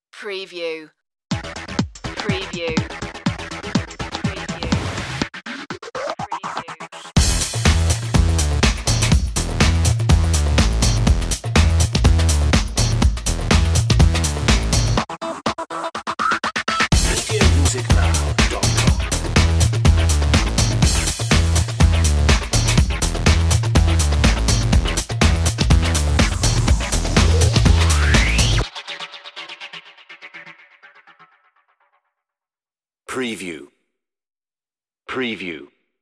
funk house

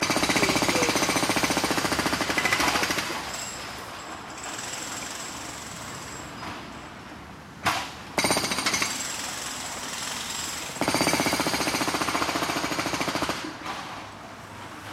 construction site hammering loop

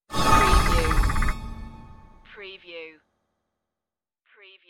magic exit swirl

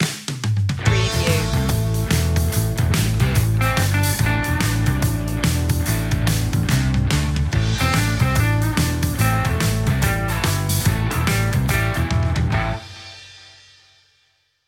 rusty gritty southern rock; 15s

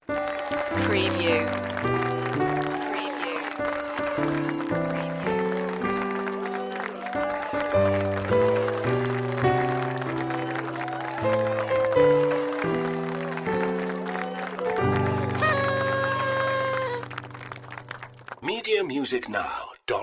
happy birthday piano easy applause party toot

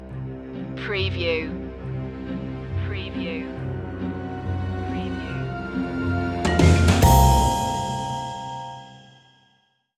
house of the creeping sounds stinger

